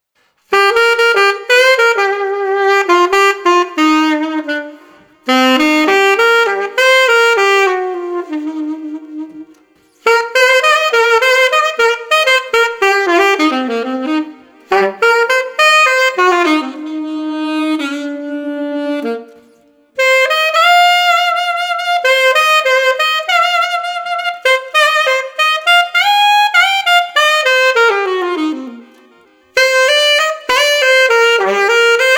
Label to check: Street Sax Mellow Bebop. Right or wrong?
Right